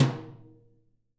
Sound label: BRUSHES, Living Drums